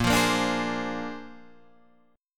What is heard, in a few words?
A sharp 9th A sharp, Guitar Open D; 8,8,8,8,11,10